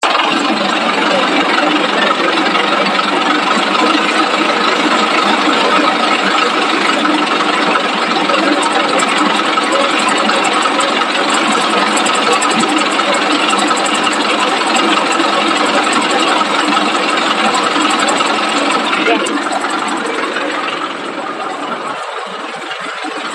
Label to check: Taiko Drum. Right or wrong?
Wrong